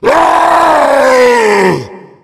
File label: tank yell; voice; Left 4 Dead 2